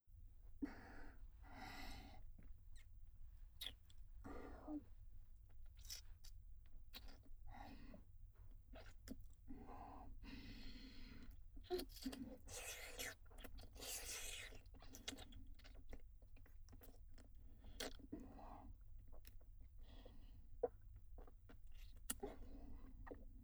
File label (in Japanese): パイズリ＆ぐっぽりフェラループトラック, ASMR; クールな奴隷エルフ姫とのビジネスエッチ→ラブ堕ち性活2～二人きりの新婚旅行であまあま天国♪